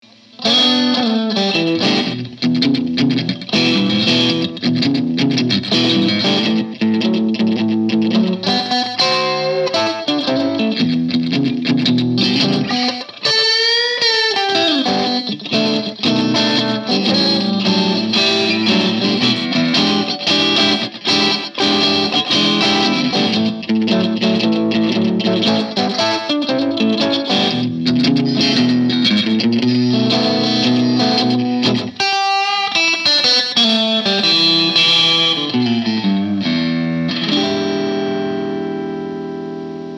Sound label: st blues blindsider seafoam green